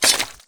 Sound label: knife slash, impacts flesh; weapons